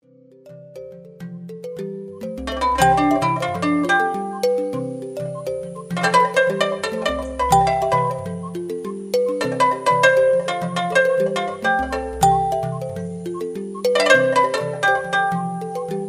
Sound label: string studio vs 3 happy spirit; string studio vs 3 demo; christian laffitte